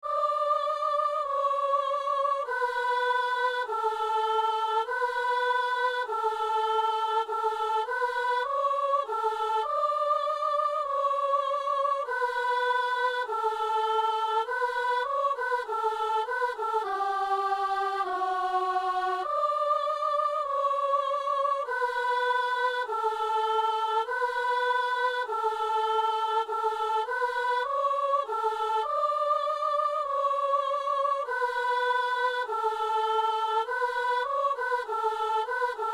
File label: alleluia soprano; Messe du 17 janvier à Saint-Denis; Alleluia de Taizé